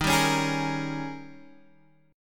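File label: E flat 11th E flat, down, Guitar, Open F; strummed; JGuitar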